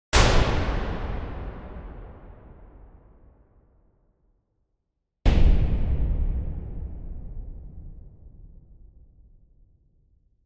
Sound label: Metals hits and booms; Strikeforce